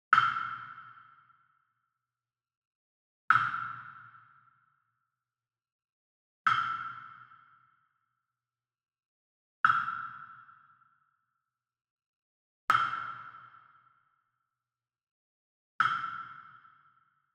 Wood Percussion Wood Block Standard Resonant Hit Far; boom library; Cinematic Strikes Construction Kit